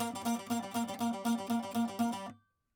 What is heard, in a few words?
saz